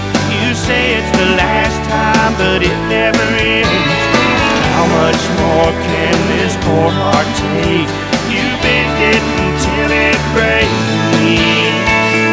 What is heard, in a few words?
breaks